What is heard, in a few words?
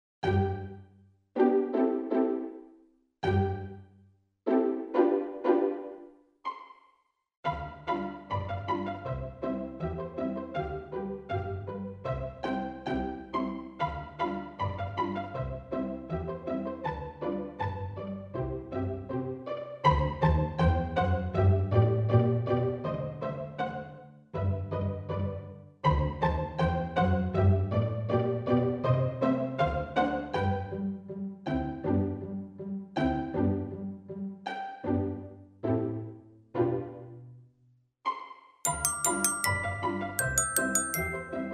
pizzicato